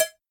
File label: Tink; HeavenStudio; rhythm Rally